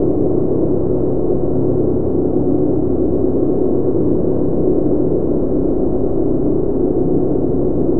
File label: Drone Ambient; Drums & Drones